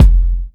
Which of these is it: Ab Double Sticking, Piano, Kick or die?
Kick